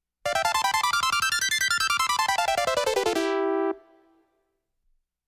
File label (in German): sequenzbrücke, Science Fiction Geraeusche